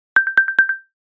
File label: focus, zvuki apple watchos 11